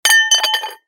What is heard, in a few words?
ceramic plate drop